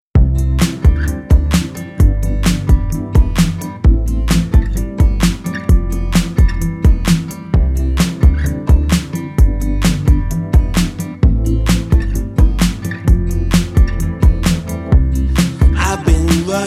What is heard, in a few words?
Electro